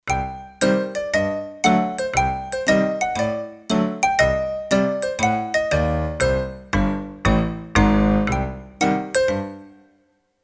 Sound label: Xylopiano, Kurzweil K2500X; Factory Programs